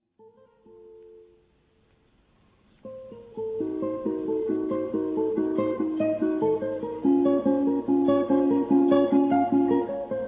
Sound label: Fantasy d moll; W.A.Mozart